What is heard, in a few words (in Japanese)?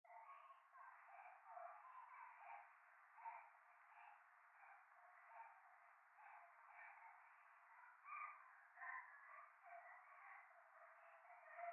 可愛い 喘ぎ 雨; 夢の中